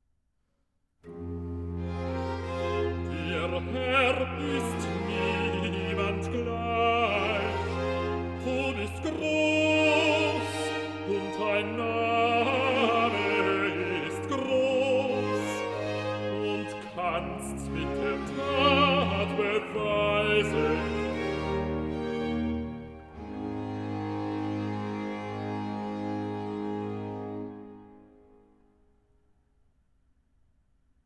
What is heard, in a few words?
recitative